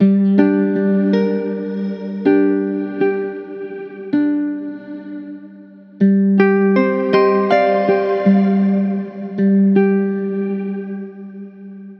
Musical Loop 80 G Minor; Vision; Ghosthack

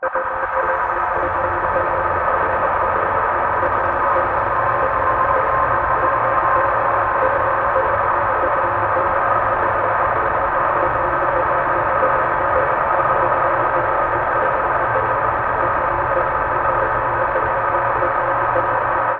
Drone A 100; Ghosthack; Nexus

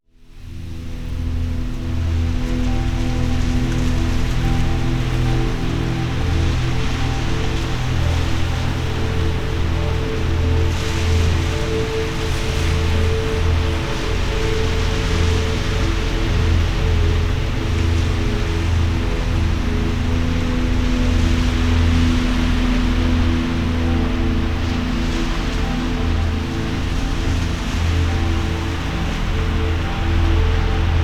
Granular Texture Waters Edge Am 65 Bpm WET; Ghosthack

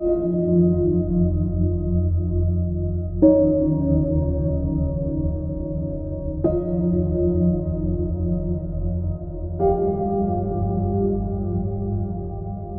Ambient Plucks D Major

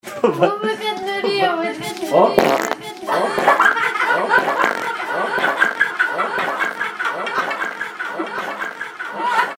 funny ring tone; vanagart

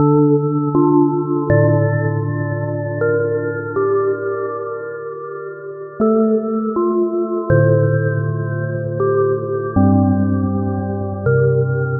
Musical Loop, 80, Bell Synth, D Minor; Ghosthack

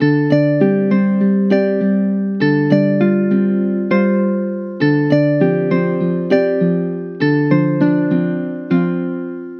Musical Loop 100 D Minor; Ghosthack; Aftermath

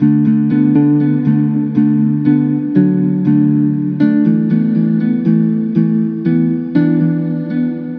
Musical Loop 120 F Minor; Dissolve; Ghosthack

